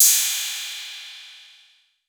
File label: 808 CYM; Wizoo - Platinum 24 Electronic; 808 SOFT P2